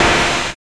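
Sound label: hi-hat open; USB Soundscan vol.11; Drums Dance & Groove; AKAI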